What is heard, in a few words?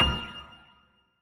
piano; osu